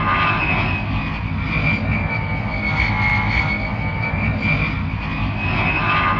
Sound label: energy core loop